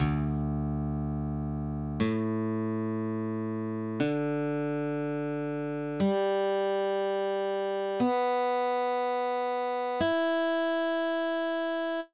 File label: drop d tuning